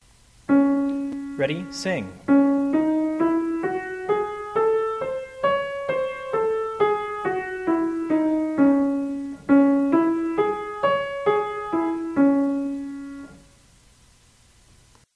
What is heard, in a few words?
Db minor louder